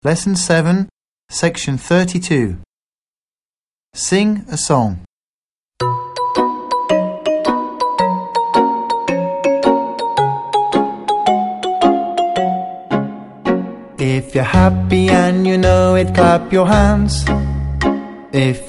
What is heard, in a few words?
song if you're happy; busy bee 4